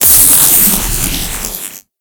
Corruption Pickup Hostile Large; Warframe; Zariman; Missions

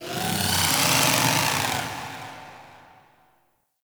Exterior Creature; Ambience; Misc Creatures; Sentient Lair; Warframe

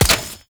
Fishing Spear Damage Hit; Weapons; Venus Fishing Spears; Warframe

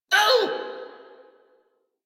Pain, en; Warframe; Corpus Aristocrat Manager; Treasurer; Battle Barks

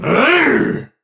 Pain Heavy; Tau; Dax; Battle Barks

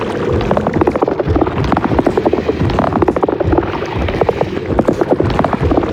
Drifter Pull Loop; gameplay; Warframe; NineteenNinetyNine; Stage12PartyOfALifetime

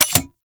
Heavy Grenade Launcher Mech; Warframe; Archwing

